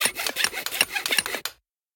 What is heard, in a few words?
structure building